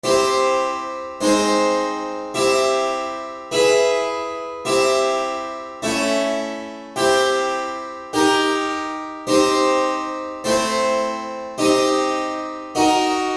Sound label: common tone modulation